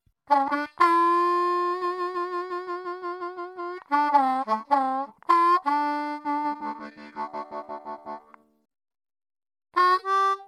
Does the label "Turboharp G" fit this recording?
Yes